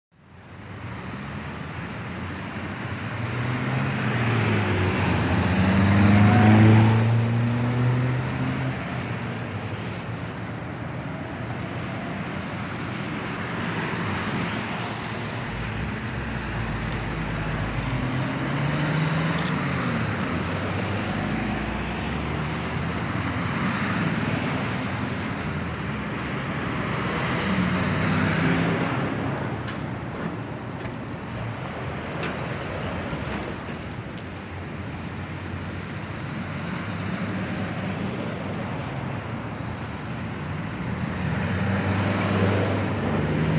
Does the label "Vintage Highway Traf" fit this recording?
Yes